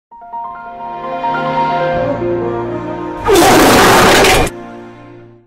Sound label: startup sound; pleroma for workgroups